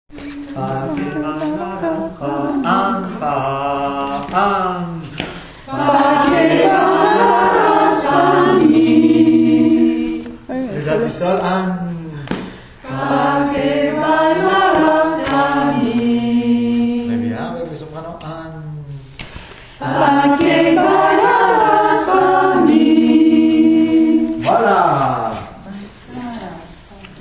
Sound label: BULLERENGUE